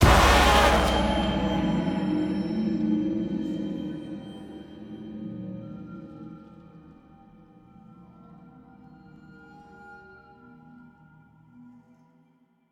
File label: End Stinger